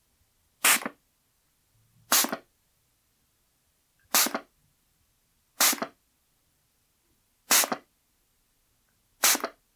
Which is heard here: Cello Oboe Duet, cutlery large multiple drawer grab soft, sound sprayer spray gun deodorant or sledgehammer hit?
sound sprayer spray gun deodorant